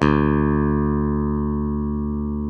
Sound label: SB PICK D1, SPECTOR BASS; Northstar - New Gold VOL-1